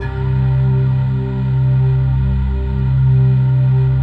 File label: PAD, DIGI-PAD